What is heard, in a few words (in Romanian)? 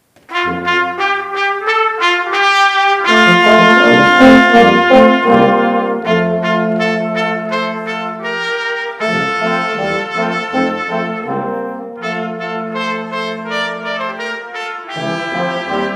Fanfara; Vineri - Nasterea Domnului